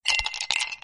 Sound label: blizzard sound; Arc of Templar